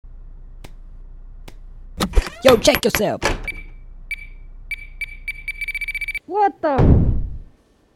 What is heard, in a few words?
Grenades; Escaping The Prison